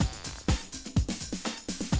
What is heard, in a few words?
125, Classic Drum Loops